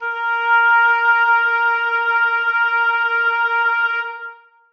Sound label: oboes sus a#4; Sonatina Symphonic Orchestra